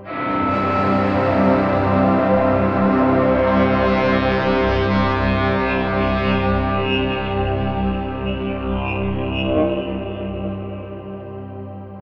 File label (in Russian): Darkness, эмбиент звуковой эффект